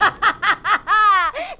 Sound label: laugh witch